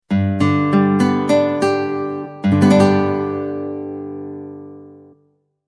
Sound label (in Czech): Akord G dur